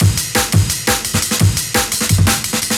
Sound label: Loops, breaks; M8